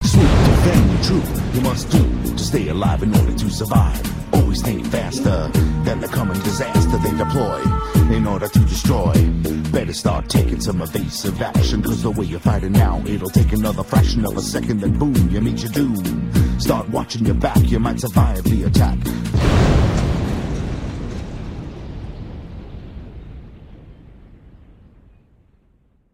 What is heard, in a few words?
rap